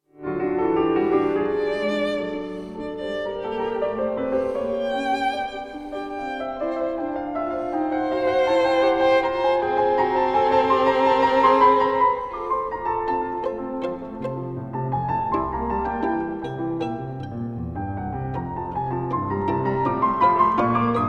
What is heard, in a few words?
sonata for violin and piano; ludwig van beethoven; op 24 in f major; spring; 4th movement rondo